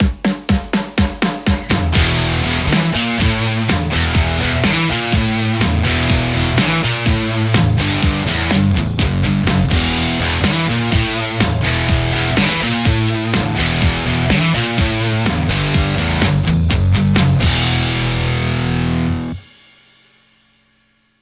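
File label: Stratocaster; mercuriall harlequin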